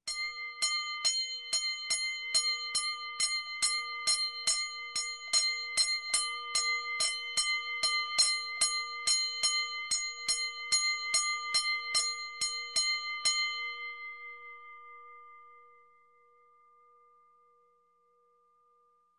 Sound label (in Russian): Kolokolcgik zvucgit podriad; Колокольчик; звучит 14 сек